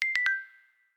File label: Downstream, notifications; Infinix NOTE 30